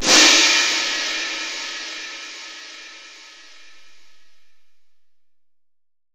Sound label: Crash, impact samples